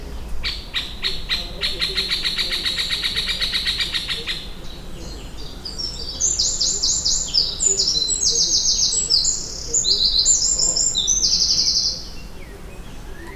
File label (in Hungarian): riasztóhang, középfakopáncs; termeszetben